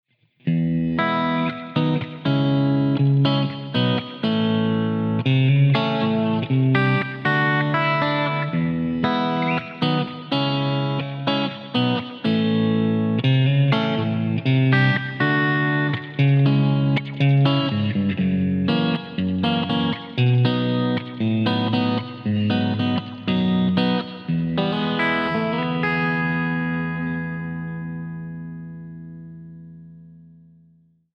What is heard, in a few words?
reverb; gt-001 tera